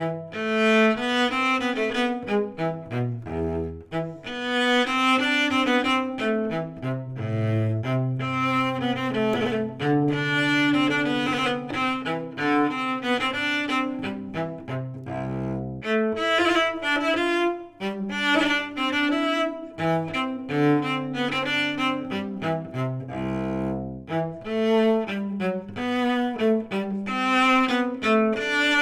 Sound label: hornpipe